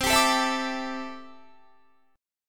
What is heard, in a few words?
C 6th Add 9th C down Guitar; C6add9 strummed